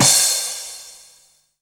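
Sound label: crash reverse, Dance Mega Drums; Best Service; Dance Mega Drums CD2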